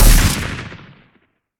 Sci-Fi Weapons-Assault Rifle-Single Shot

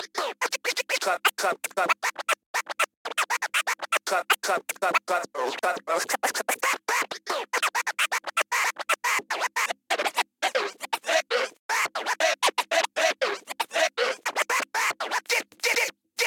90 hiphop 118bpm surf continuous; gamerip; Hot Lava

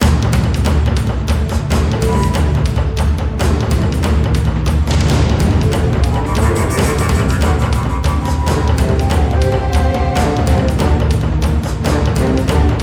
BGM Field Start Temple; God Eater Resurrection

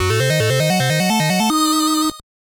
Level Exit; Almana no Kiseki; FDS

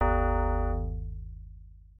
Coin Player; arcade; gauntlet

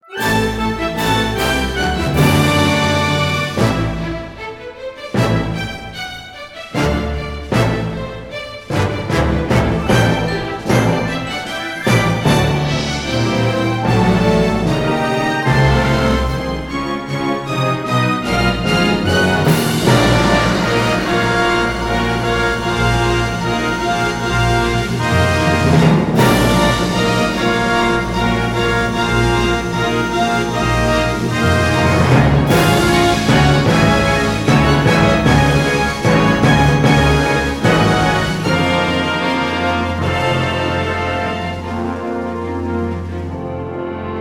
BGM Event Decisive Battle; Neo Atlas 1469; PS Vita switch windows

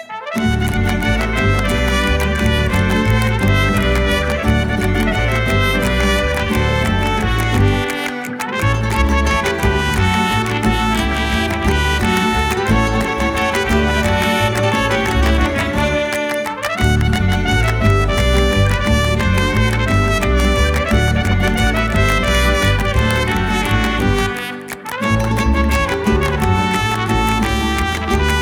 Mariachi

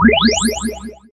Jingle World clear; Super Monkey Ball Banana Blitz HD

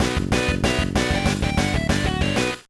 Jingle, gba; Backyard Baseball 2006

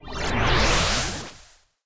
Escape

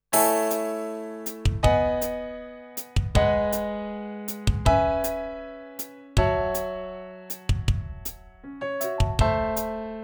karaoke; yuusha to kimi ni hanataba o

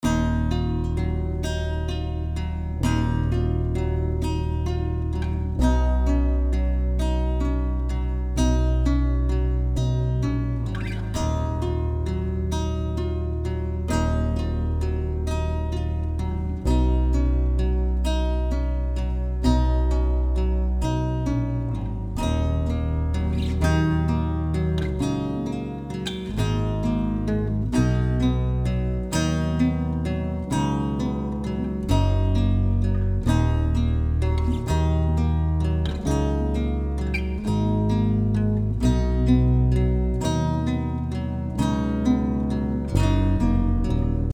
Guitar; Agatha Christie And Then There Were None

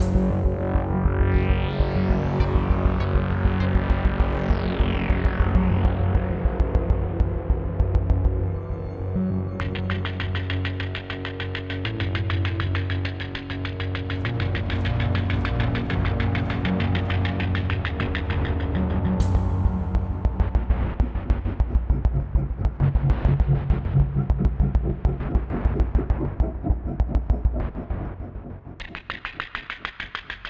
Boss 100BPM; DalekBoss

